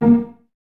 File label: Cellos Pizz A#3, String Ensembles; Miroslav Vitous